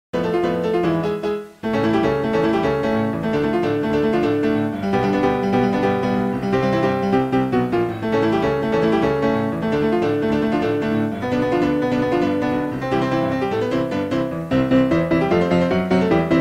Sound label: Synthesia Midi; Super Mario Bros World Refilm